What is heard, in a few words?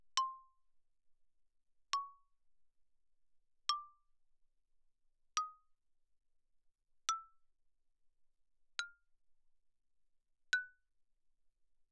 xylophone rosewood mf C6 B6; Percussion